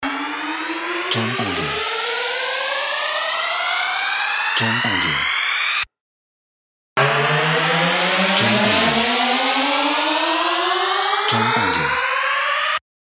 Riser

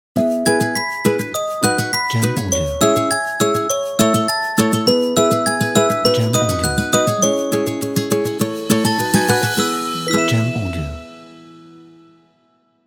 Positive Logo Intro

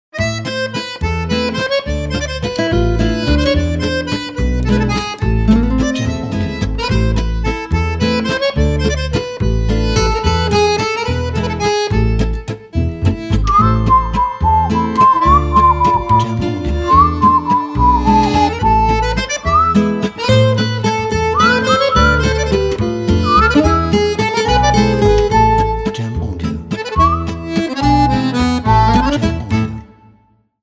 French

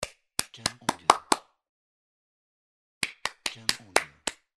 Clapping Hands

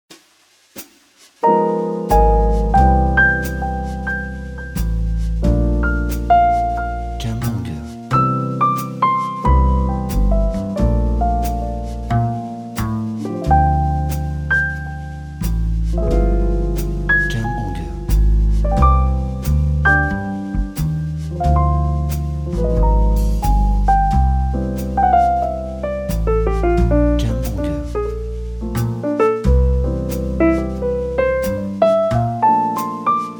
Piano Slow Jazz

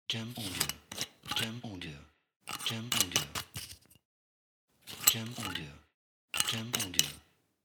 Bottle Cap Open Close

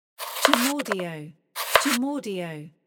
Cutting Vegetables

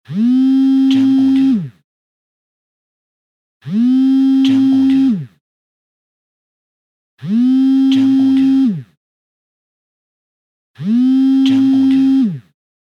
Vibration Phone

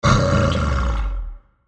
Lion Growl

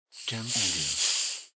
Opening Sparkling Water

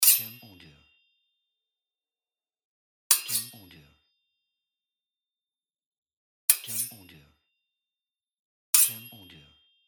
Sword Fight

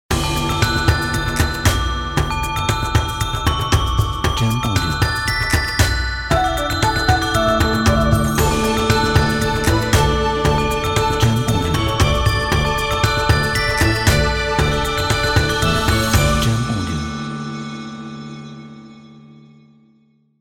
Chinese New Year Happy

